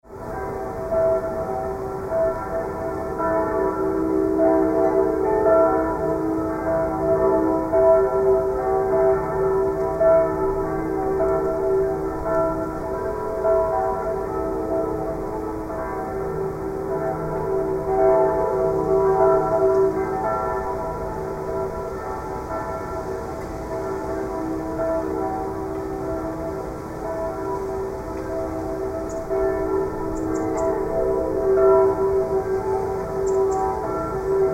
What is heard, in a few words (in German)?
Glocken Dresden